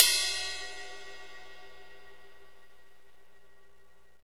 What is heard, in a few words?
cymbal, Roland; Northstar; Drumscapes Roland; Cymbals 1